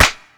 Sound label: Clap